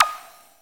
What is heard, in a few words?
plink